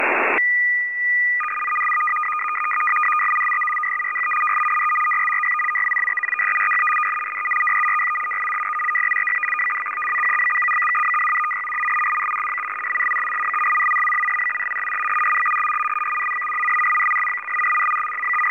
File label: fsk100